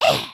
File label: stretch, emotes